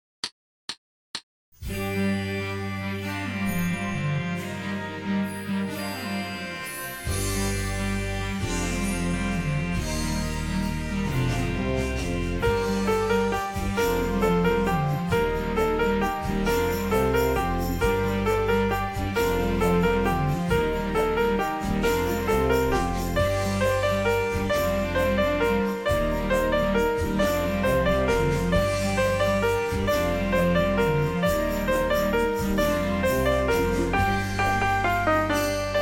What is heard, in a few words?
bell carol, slower, 132 bpm